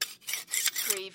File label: Knife sharpener, sharpen kitchen knife